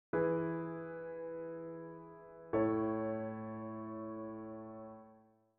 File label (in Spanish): cadencia perfecta la M; cadencias